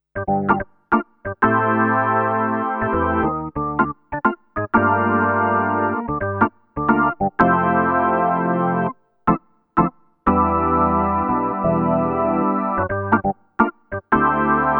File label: DP25 Rock Organ